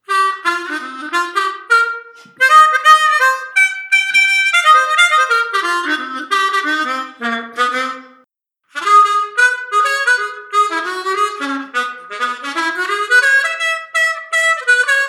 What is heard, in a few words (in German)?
Pentatonik Impro C Db D, Seydel